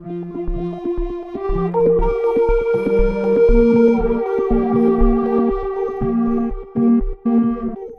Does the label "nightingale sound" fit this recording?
No